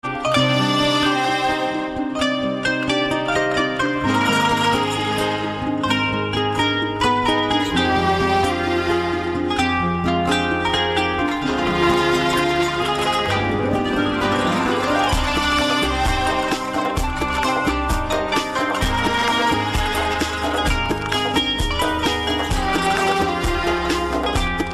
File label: noor arabic